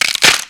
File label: Wood break